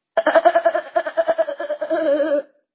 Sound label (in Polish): smiech